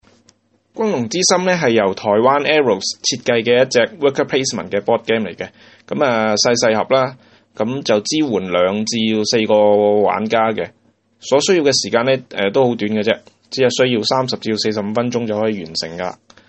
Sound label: Jungle Rumble